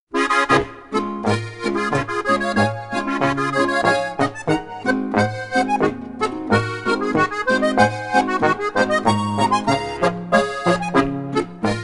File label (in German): Harmonika